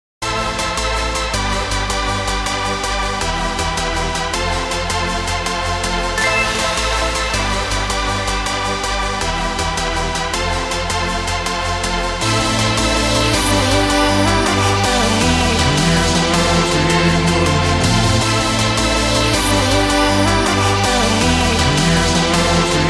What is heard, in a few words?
trap